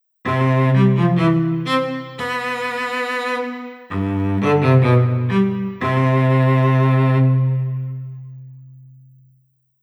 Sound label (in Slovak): violoncelo